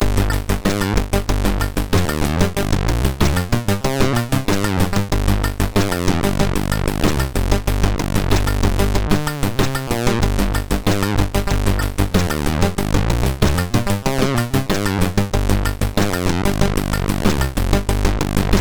Funk, MOS6581R3

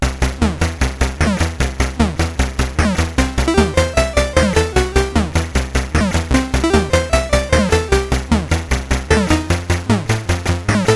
CSG8580R5

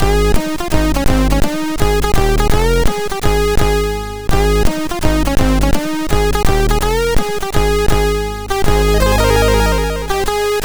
Xmas Cards, sid MOS6581R2

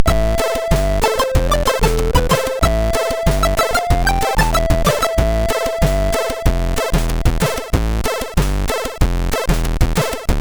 Tumti-Dum, MOS6581R3

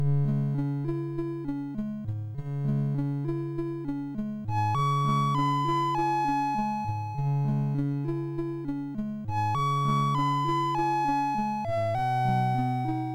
MOS6581R3, GAMES; Snowman Mr Freeze

